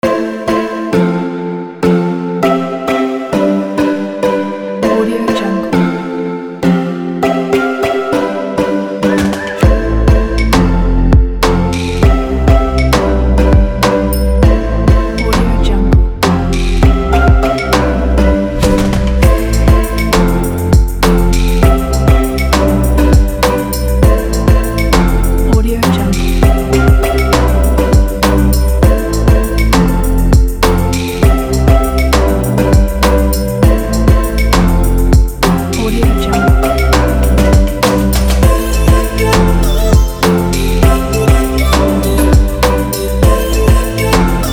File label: summer pop